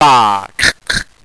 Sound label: shotgun; HALFE LIFE 3